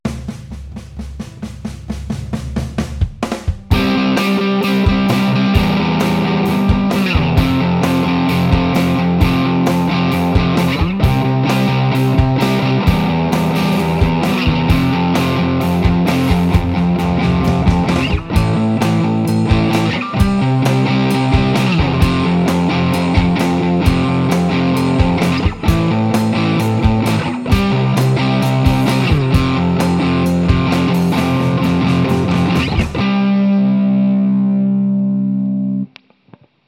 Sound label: Electric Guitar